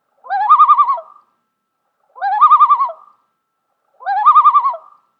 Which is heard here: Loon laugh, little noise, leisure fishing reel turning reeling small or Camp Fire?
Loon laugh